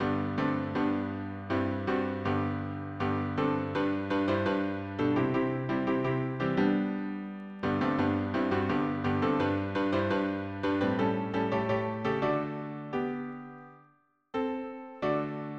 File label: harmony piano